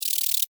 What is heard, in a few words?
Beetle, Rattle, Vocalization, Insect, Bug; Beetles; Sound Effects:Animals:Insects; Blastwave